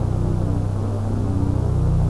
atmosphere

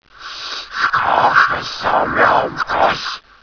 zombie alert; zombie barney

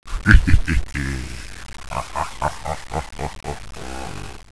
zombie idle; boomario

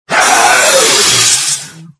lizardm dead

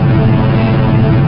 alien flying

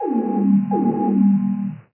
vessel alert loop

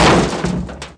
metal

